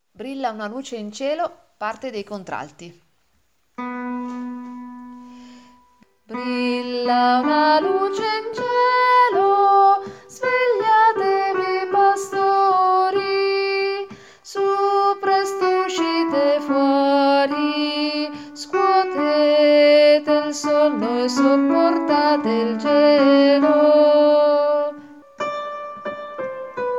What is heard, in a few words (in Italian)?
contralti